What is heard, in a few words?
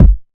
bassy bass drum one shot g key; kick drum samples